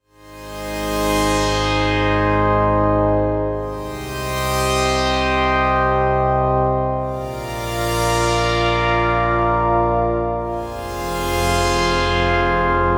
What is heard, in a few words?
Analog Pad; Blend